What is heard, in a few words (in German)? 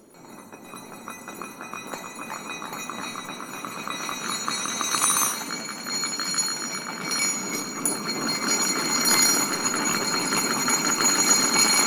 Ringe am Boden